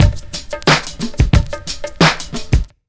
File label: 90 BEAT, Pure Electro; USB Soundscan vol.03; AKAI